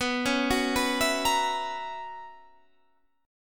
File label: B Major 7th Suspended 2nd Suspended 4th B down Guitar Standard; arpeggiated; JGuitar